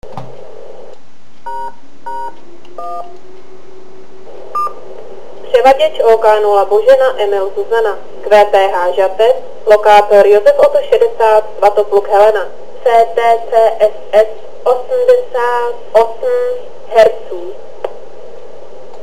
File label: air DTMF cmd cross cross; OK0BEZ